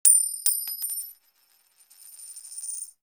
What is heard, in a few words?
Coin Drop on Concrete; Foley